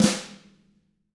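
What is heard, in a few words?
tama flam; ILIO; Double Platinum Drums 1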